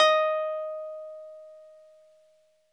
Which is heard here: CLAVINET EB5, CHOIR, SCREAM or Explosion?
CLAVINET EB5